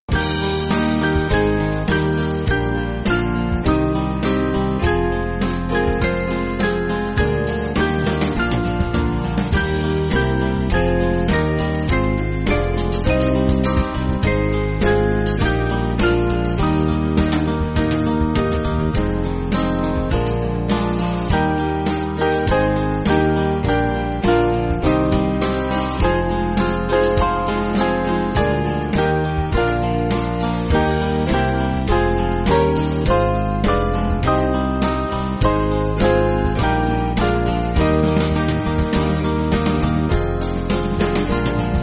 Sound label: Band, Music Backing Files for Churches; Small Band; 6/Eb; Come To Our Poor; Angelus